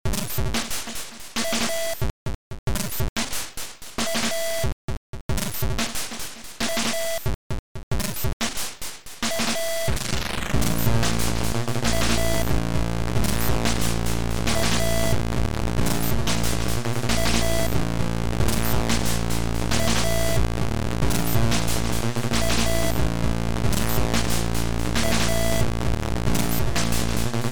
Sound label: Street drums